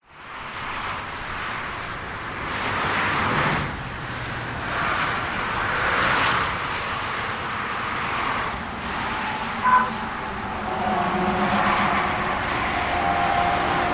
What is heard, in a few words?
traffic Germany autobahn busy; a8